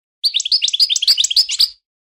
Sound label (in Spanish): floreo tibali tibali, campero